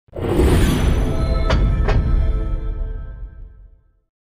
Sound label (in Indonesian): Nada Dering Intro; Mobile Legends